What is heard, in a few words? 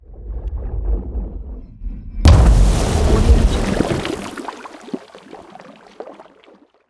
water explosive water hit; magelem; soundmorph